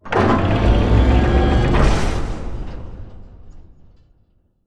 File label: Robot Arm Tilt Up Close; Boomtown; Titanfall 2